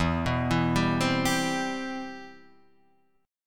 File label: C Major 7th, E, guitar chord